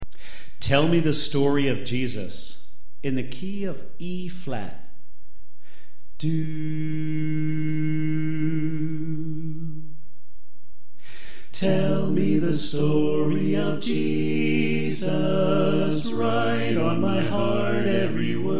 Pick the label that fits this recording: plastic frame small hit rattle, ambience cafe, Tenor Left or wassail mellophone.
Tenor Left